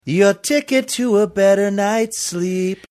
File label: singing your ticket to better night sleep